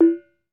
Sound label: BONANG, Bronze Gamelan